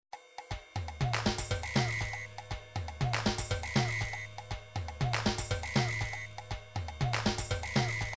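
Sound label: drums pattern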